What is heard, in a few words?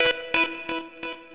switch, Loops Electronic